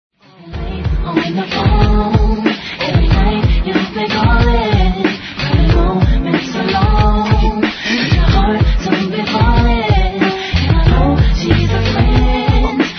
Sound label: ringtone